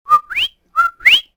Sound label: whistle; Soldier Of Fortune II Double Helix; XBOX